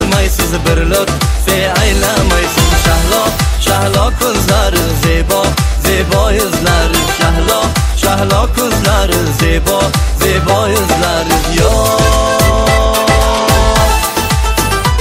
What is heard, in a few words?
uzbek ringtone